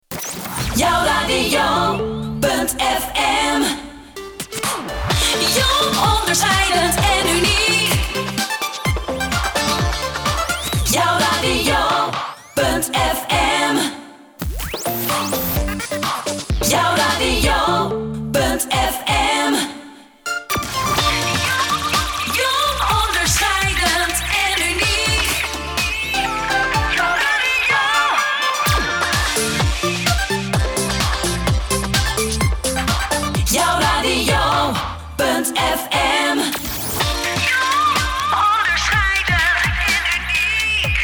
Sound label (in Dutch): Radio Gezongen Jinglepakket